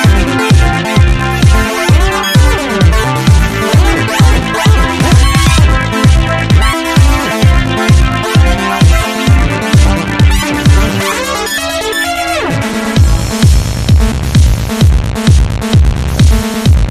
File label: Synth Drop Ya Hype